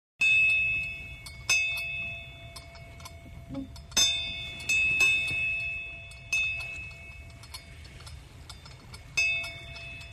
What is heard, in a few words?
Bell Rings From On Boa